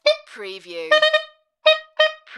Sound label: Sax Beeps